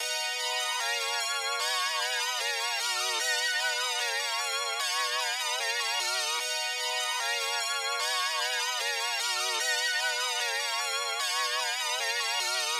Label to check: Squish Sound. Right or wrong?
Wrong